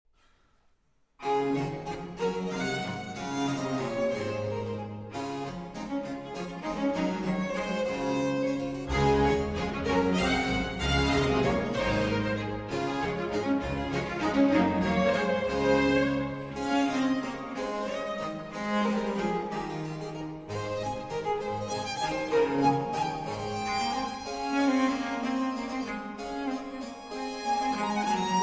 Suite in D Major For Strings IV. Menuet Alternativ - L'autre Menuet